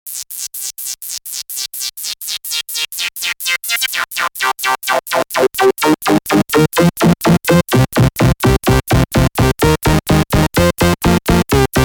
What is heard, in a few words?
Solid Disco